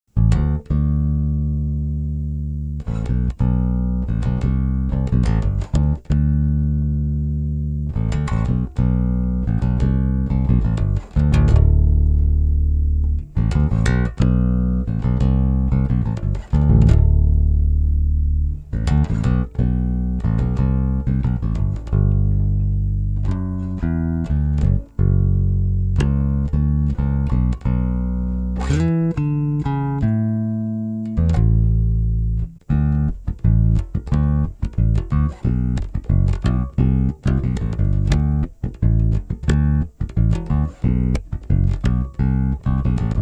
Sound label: Country Mix, basses; Hybrid 5